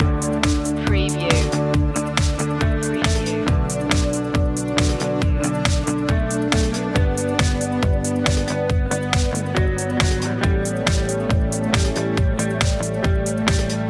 positive day loop

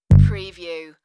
Bass Doing

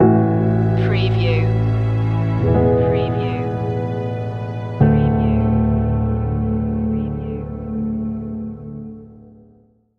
angels and hope stinger

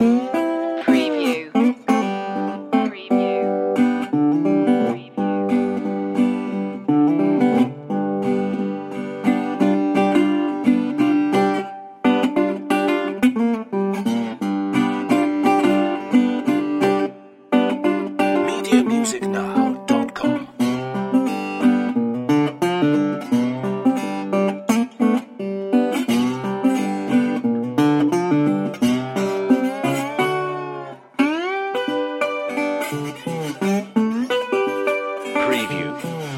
blues

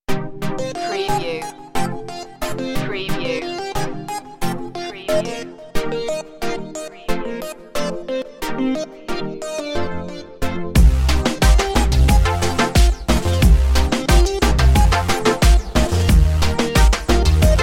reggaeton

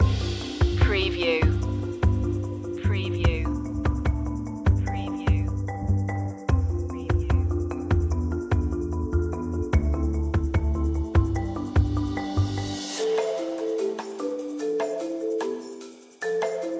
flying home loop

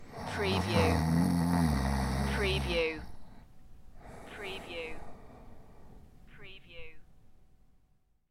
Snoring, Male Snore

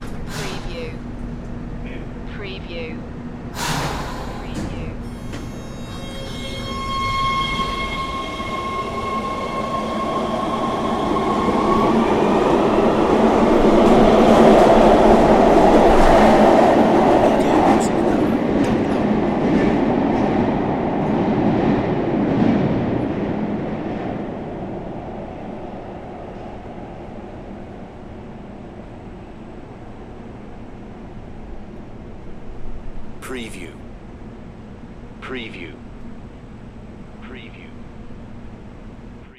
Subway Train, Air Brake Release, Accelerate Away

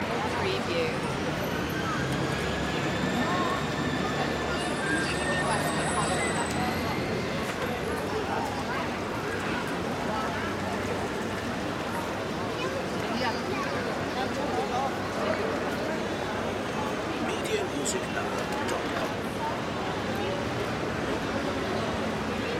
busy city life loop

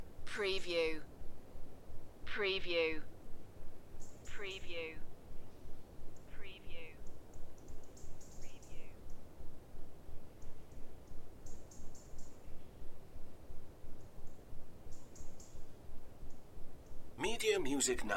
Welsh Forest ambiance with birds; beanstalk audio

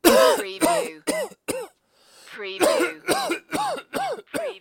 Coughing, Male Cough

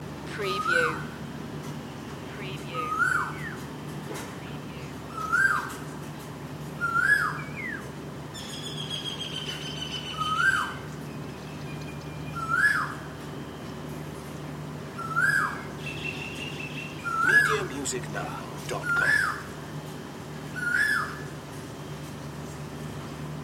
thailand residential morning loop

